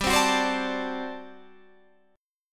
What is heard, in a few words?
G Major 7th Suspended 2nd Suspended 4th G down; GM7sus2sus4; strummed